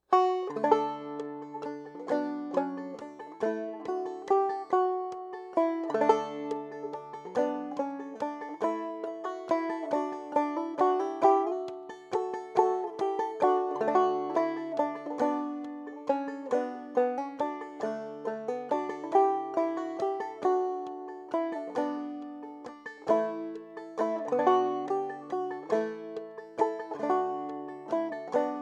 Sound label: fast; Christmas